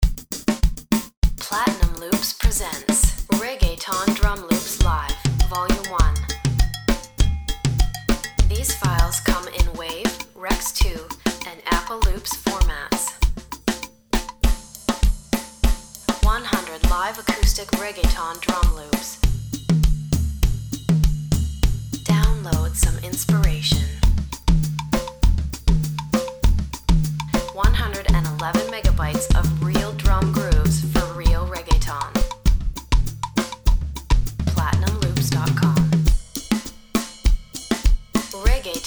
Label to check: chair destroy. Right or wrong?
Wrong